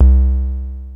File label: Kick, Nord Modular Drum Samples; Yawgmoth